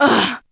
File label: SEX; Zero G; Man Machine